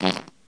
fart

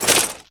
fidget move

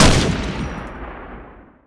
deagle

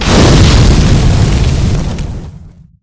explosion medium

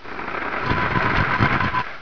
machine crush

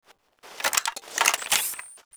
rifle laser reload in out; weapons

